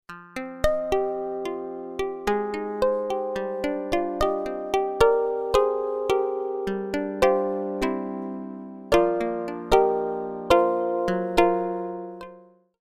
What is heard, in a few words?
OP-1 String